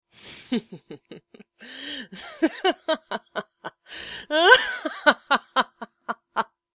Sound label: Mwahahaha